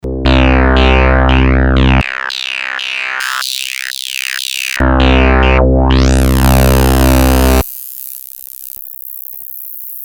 test filter mode, novation bass station 2

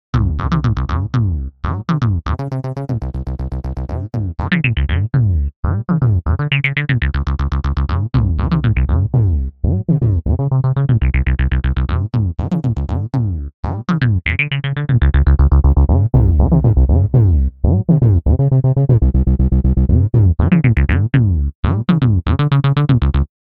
bass; Emu XL1 Xtreme Lead

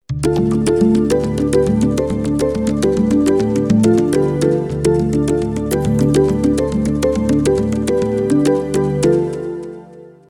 synth happy island, roland jd800

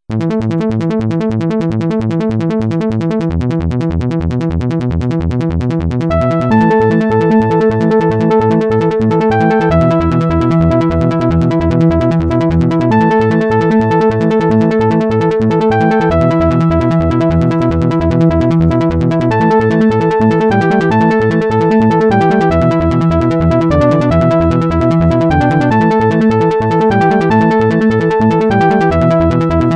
bass, yamaha tx81z

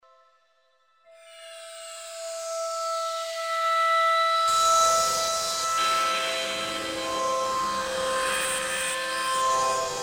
bending; korg 03rw

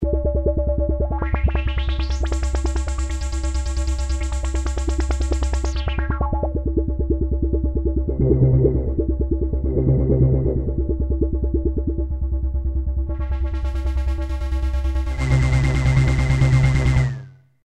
arpeggio bubble, Yamaha CS1x CS2x

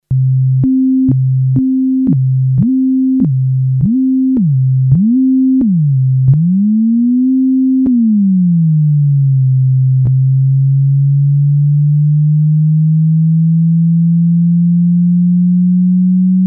portamento, yamaha tx81z

